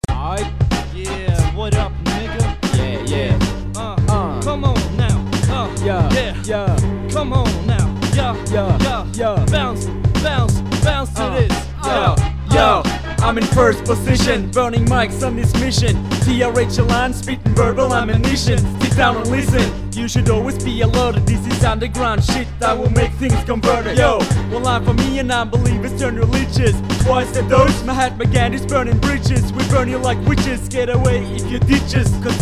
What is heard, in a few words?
Beats, Bounce